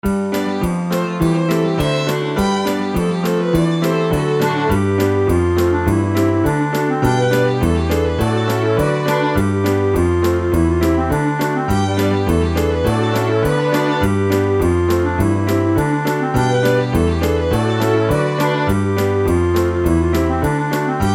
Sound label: lively